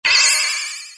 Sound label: teleport; Robot Wants Fishy